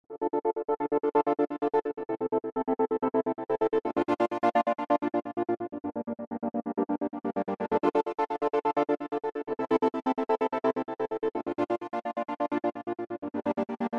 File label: Horns Long Sustain Gate